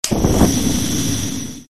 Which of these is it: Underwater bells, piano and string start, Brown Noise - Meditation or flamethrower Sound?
flamethrower Sound